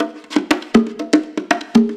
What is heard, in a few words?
Bongos 121 bpm; Loops; Drums; Percussion; Ableton Live 12 Lite; Core Library